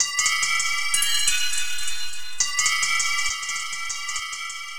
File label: Cymbal Melodic; Sonic Foundry (Sony Creative Software); Myths of Technology